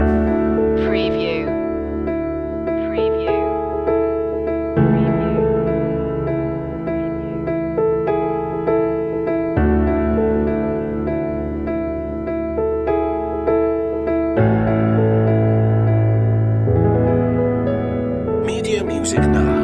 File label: angels and hope loop